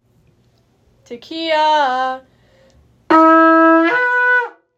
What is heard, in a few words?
tekiyah